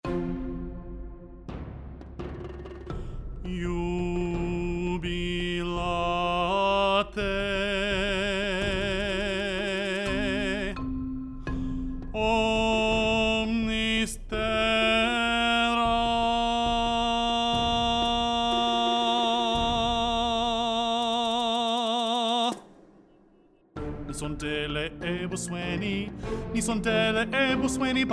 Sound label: Tenor Only